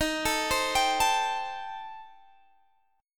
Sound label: D sharp 6th Flat 5th, Guitar, Drop D